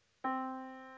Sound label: yamaha Disk